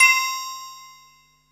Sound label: Synth Bells; Dream Zone